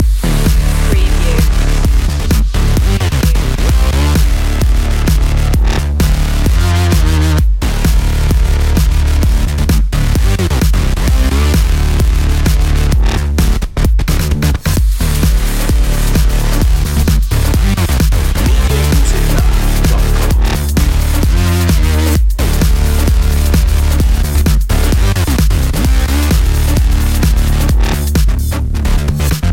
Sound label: acid funk sandwich loop